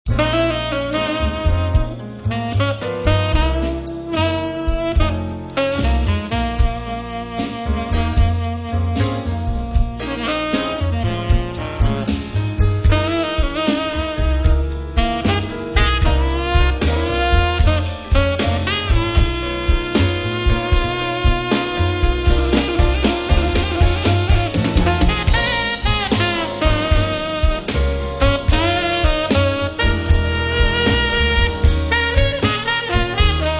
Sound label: Dirty Tenor